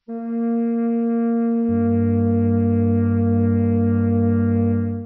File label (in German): flöte